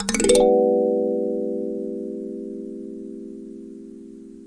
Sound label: pretty strummy bell gliss; Orchestral